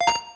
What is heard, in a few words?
coin nickel; horizontalrunner